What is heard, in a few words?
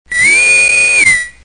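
trek whistle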